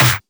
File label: Snare; Vengeance Samples